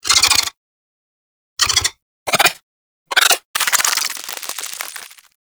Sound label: Helminth Interact Mouth Roomba Move Speak; Liset Alchemy Lab